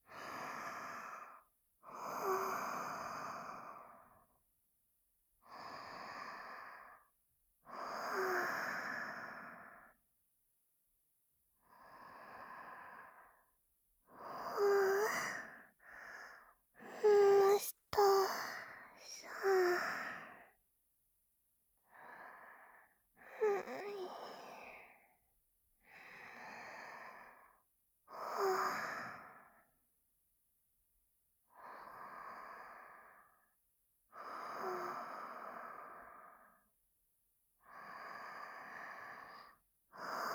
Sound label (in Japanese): ハデスの寝息; こっそり録音